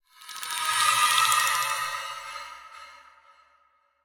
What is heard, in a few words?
scorpion incap healpad; smite; npc